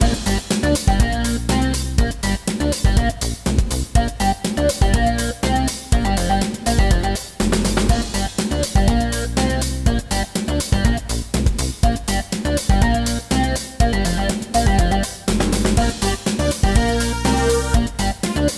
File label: encounter tough guy; Manamon Music; Manamon 2